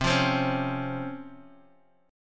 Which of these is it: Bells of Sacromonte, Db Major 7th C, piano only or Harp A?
Db Major 7th C